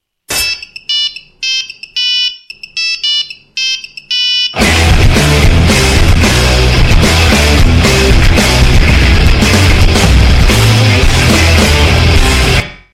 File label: nokia roking ringtone